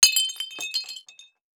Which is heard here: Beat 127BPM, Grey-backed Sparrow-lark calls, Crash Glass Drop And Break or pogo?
Crash Glass Drop And Break